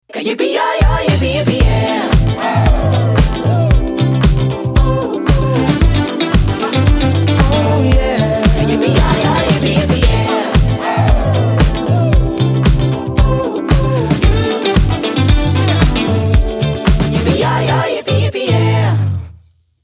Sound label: funk